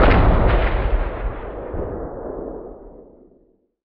distance boom; WAR

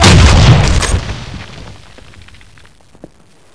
grenade; WAR

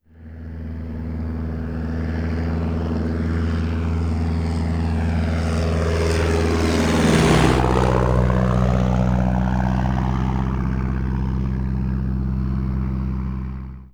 plane distant passing; WAR

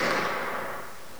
mp40 ringout; WAR

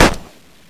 30cal; WAR

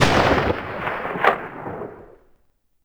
mg sten ringout extra; WAR

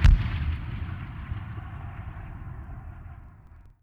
distant gun; WAR

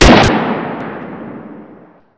kar98; WAR

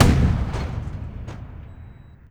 tank gun; WAR

